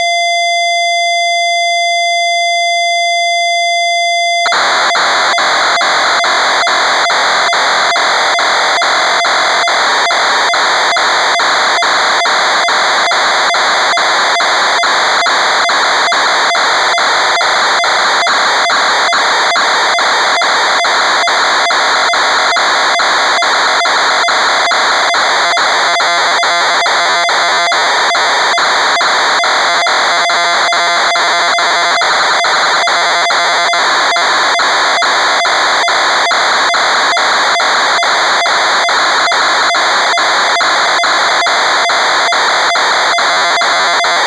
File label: COYOTE